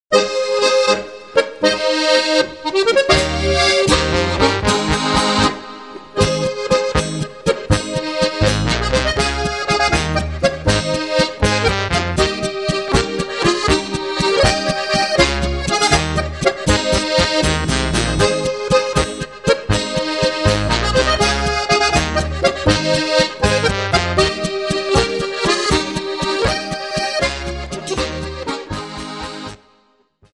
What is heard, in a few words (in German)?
harmonika